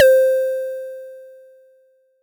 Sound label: SY TAMBI; ModelCycles